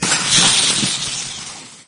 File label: acid fadeout, acid spill trap; traps